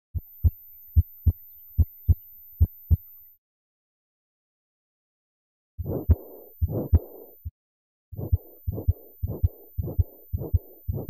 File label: Heartbeat Sound Effects